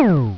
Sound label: Beam; Grid Warrior™ Demo